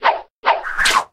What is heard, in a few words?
attack